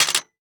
Technology Electronic Tripod Light Tripod Setting Down On Concrete